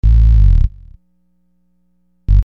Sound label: Big Buzz Bass, Hip-Hop; Construction Kit; Sonic Foundry (Sony Creative Software)